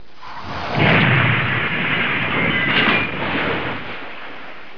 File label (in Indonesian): suara mobil